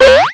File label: game boing